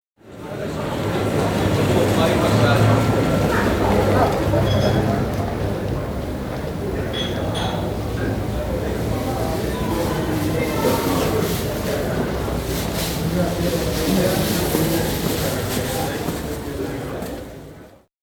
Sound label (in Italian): Calle stretta; suoni; Lipoli